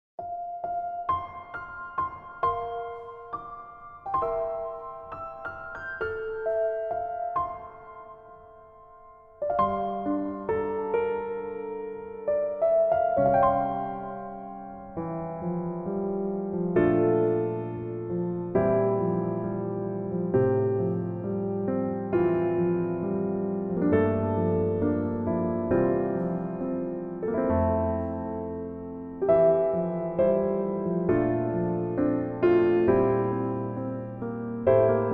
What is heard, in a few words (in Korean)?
Piano ver 멜로디MR -1키